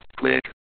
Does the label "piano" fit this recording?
No